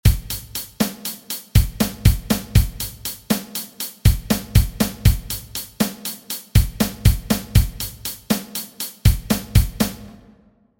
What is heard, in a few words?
beat